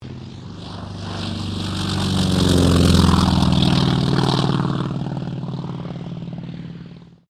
AIRCRAFT T-28D TROJAN TAKEOFF; Airplanes (Sound Effects:Vehicles)

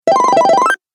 Retro Videogame Fx Mini Bonus; Video Game Sound Effects; Retro Videogame Fx - Mini Bonus 02

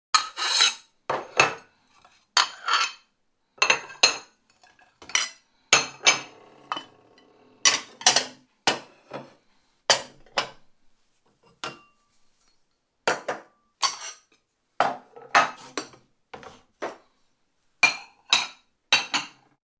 Sounds of crockery being placed; Dishware; Household

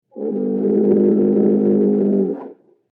Fierce Bull Vintage; Cows; Sound Effects:Animals

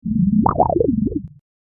Warped Water Swampy; Water Sound Effects; Andrew Fleming

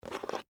Game Piece Up, Metal, Pick, From, Board; Sports; Monopoly; BlastWave

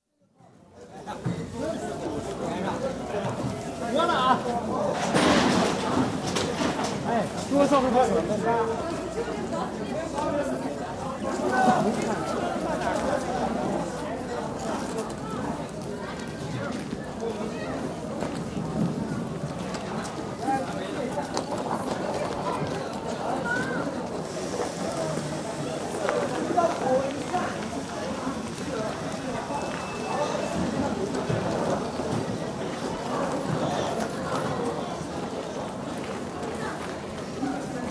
Datong: Railway station atmosphere; Train; Vehicles; BBC

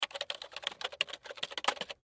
Computer Keyboard, Computer Keyboard Type Fast; Office; Typing; Blastwave

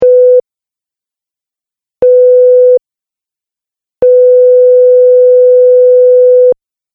Censor Beeps; Beep Sound Effects

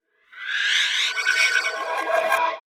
Whoosh Airy Sweeper Delay; Whooshes; Blastwave

